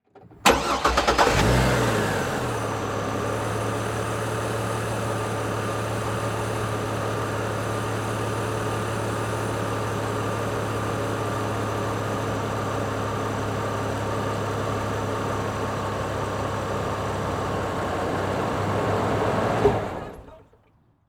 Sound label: Transportation Chevy Trailblazer Start Idle Off Exterior